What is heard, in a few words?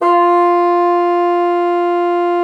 bassoon, F4; Xsample VOL-6